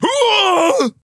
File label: scream